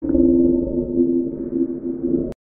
growl; Dragonine

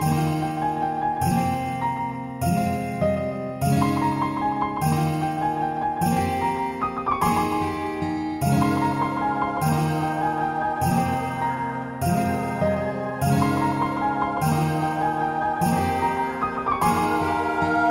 piano

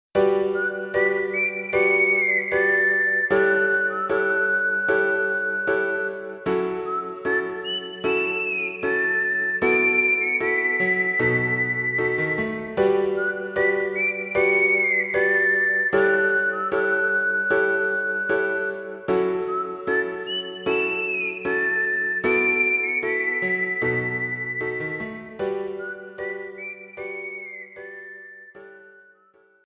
Game Over

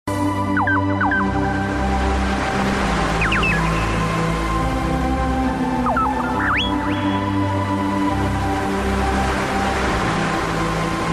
Tropical Mix

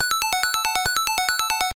Twinkling Lights

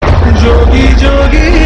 Msg Tune; Jogayya

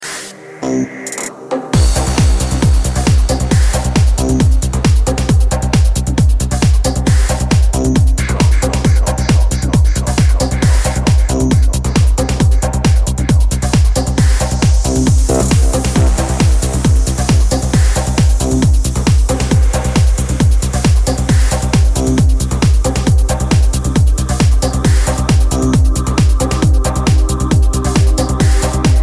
Progressive Trance

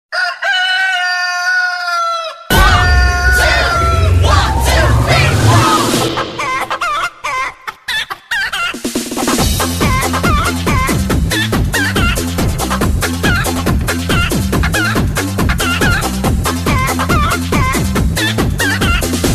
Chicken Song

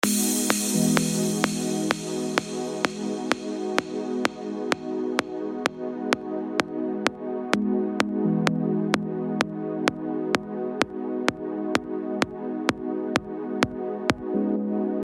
smooth peaceful

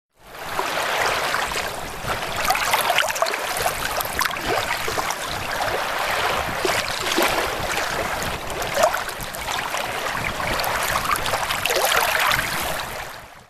Toilet Waves

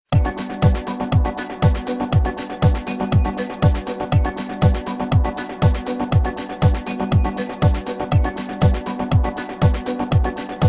Rave Monkey; Aztec

